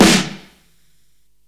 Snare Hard Punchy, Psychedelic Drums

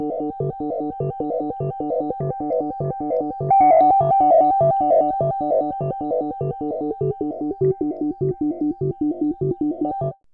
ARPEGIO, RHYTHM; AMG - Vince Clarke Lucky Bastard

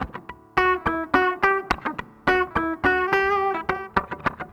Garage Funk Guitar, 70s Analog Funk; Sonic Foundry (Sony Creative Software); What it Is!

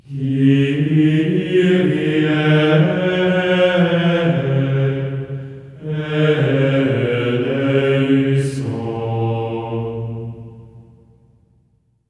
KYRIE D#4, voices; Spectrasonics; Symphony of Voices; GR KYRIE

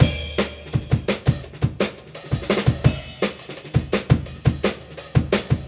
processed acoustic drum kit; FRANTIC KIT

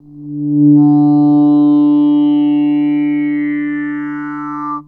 Guitar Textures

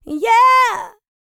LICKS, Vocal R&B & Dance; ILIO; Vocal Planet

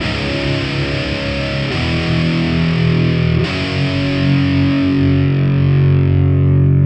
Distorted Guitar 140BPM C#min; Vengeance - Essential Clubsounds Vol.4

